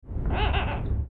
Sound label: coturnix adansonii, birds calls; phasianidae